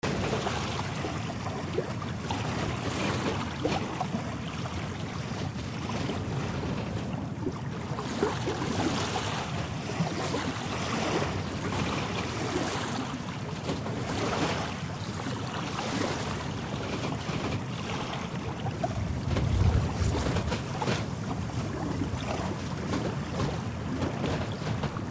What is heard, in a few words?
harbor ship creaking